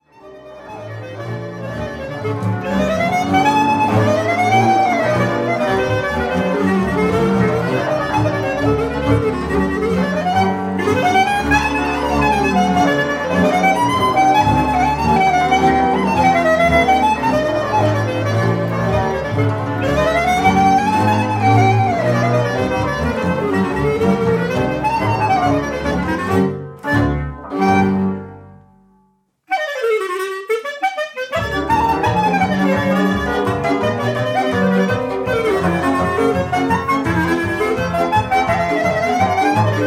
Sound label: Clarinet solo